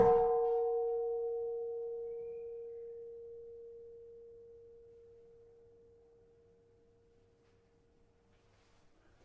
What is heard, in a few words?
Keno 4 A Sharp, gamelan tuned